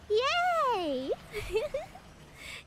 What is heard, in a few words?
Yaaaay